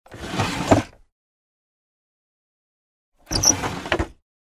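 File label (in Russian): старый деревянный письменный стол ящик открывают и закрывают со скрипом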